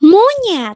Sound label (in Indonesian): monyet